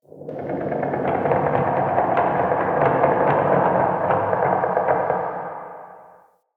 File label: Root Cave Root Creak Large; Ambience; Neathuns Root Cave